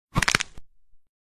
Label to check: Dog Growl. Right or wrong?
Wrong